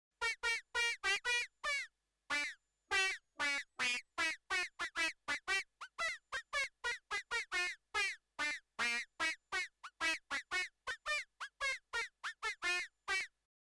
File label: theremin, freqbox moogerfooger; Theremin Moog Epro – staccato; VCO: 0 SYNC: SI WAVE:tri ENV AMT: 3 FM AMT: 3 MIX 4; MF-107